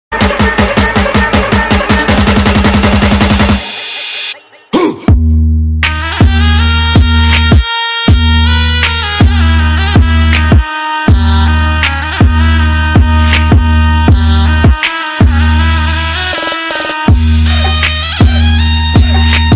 Asaya Music Ringtone

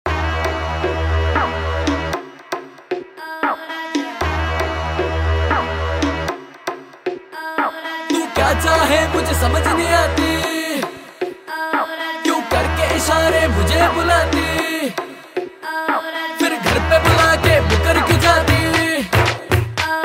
New Romantic Hindi Song Ringtone

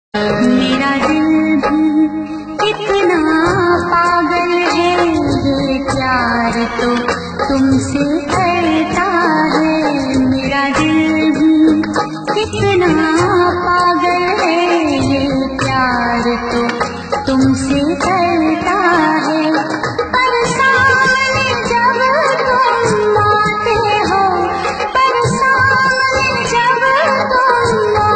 Old Bollywood Song Ringtone

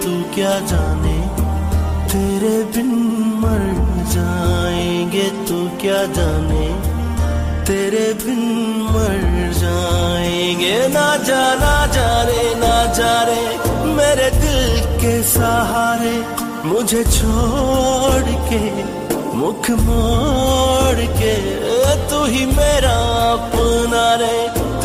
Sad Love Song Ringtone

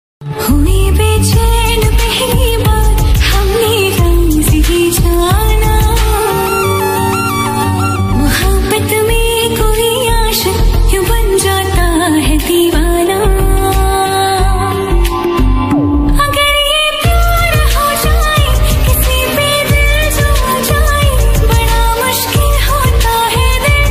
Hindi Song Ringtone

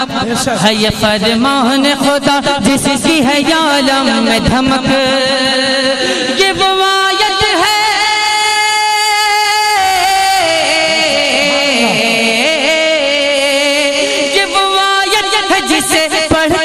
Naat

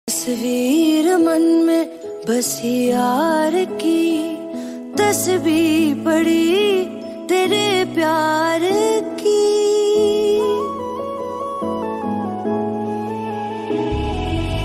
Hindi Song Status Ringtone

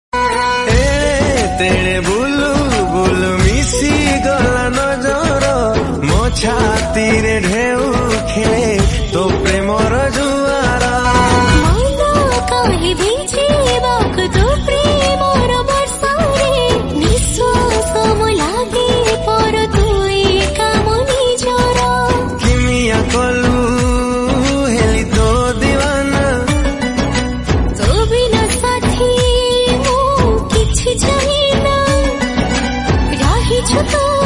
Odia Song Ringtone

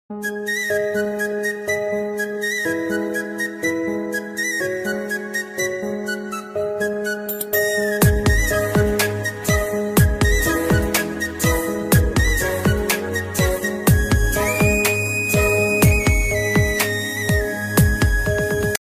Nice Flute Ringtone For Mobile; ringtones

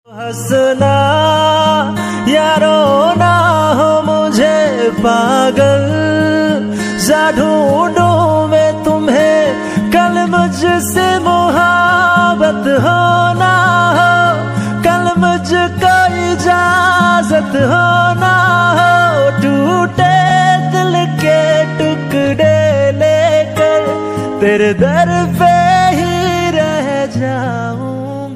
Sad Hindi Song Ringtone

